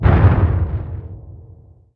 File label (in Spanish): saurian boss temblor